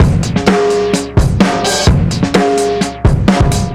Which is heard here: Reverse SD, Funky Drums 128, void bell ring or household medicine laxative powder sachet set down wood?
Funky Drums 128